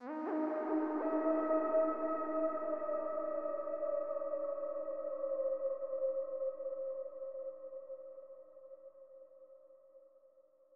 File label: Synth Wolf Howl; Zenboy1955